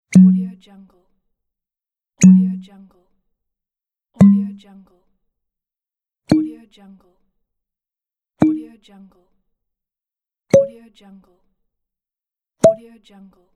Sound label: glass bottle cork pop; sfxforyou